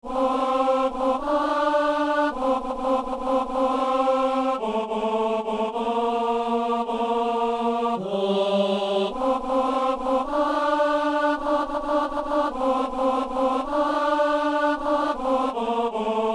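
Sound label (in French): ténor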